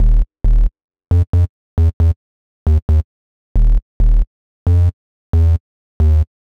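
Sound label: Sq Bass Riff Eb-F-E, square bass; Grime; 135bpm; Zero-G Sound Sense Grime Scene; Construction Kits; A Positive Vibe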